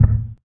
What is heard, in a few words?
stomp; creature